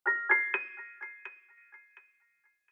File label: GoGo, notifications; Lenovo; ZUI 13